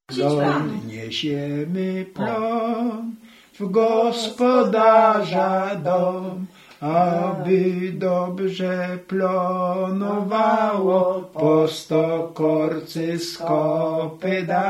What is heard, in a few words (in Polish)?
Plon niesiemy plon